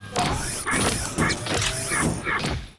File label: accretia guardtower idle